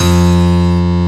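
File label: piano attack F2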